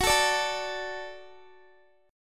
F sharp Minor Sharp 5th F sharp Guitar; F#m#5 strummed

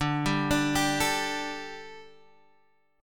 D Suspended 4th, Guitar, Half Step Down

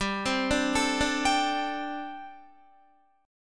G Suspended 2nd Suspended 4th, Guitar; Gsus2sus4; arpeggiated

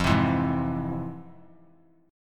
F Minor Major 7th; FmM7 strummed; 3C,3F,3A,3D; 17,19,19,18

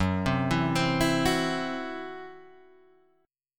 C Major Flat 5th F sharp

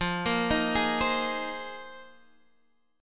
C Minor 7th Sharp 5th, F, guitar chord; arpeggiated; JGuitar

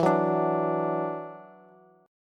E 7th Suspended 2nd, down, Bass; E7sus2; strummed

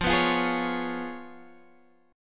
C sharp 7th F, chord

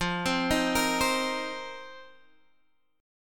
C Minor 7th F; Cm7/F; arpeggiated

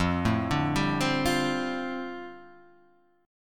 G 7th Suspended 2nd Suspended 4th F chord

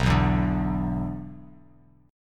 B-Minor Double Flat 5th B down, Bass Standard; Bmbb5 strummed; JGuitar

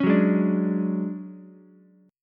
D Major 7th Flat 5th D down Ukulele; strummed; JGuitar

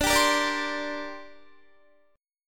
D 7th Suspended 4th Sharp 5th down Guitar; D7sus4#5; strummed

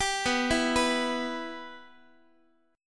B Suspended 4th Sharp 5th down Guitar; Bsus4#5 arpeggiated; JGuitar

